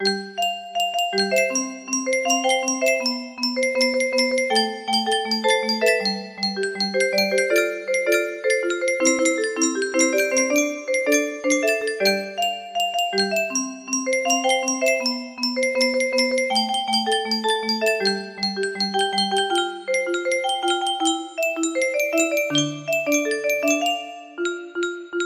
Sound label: music box